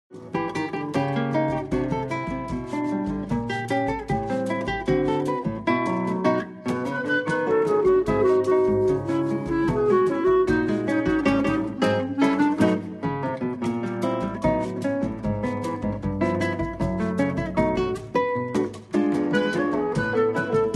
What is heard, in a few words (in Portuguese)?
samba chorado